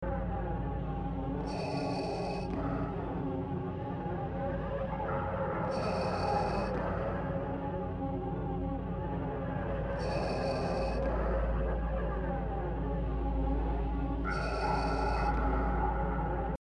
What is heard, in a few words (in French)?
Respiration Dark Vador